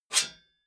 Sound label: metal sheet scrape fast